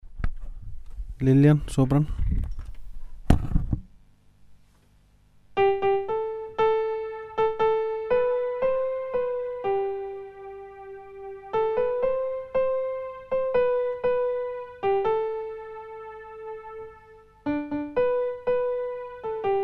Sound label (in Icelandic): sópran